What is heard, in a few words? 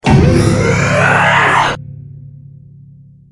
charge; freak fortress 2; isaac tboi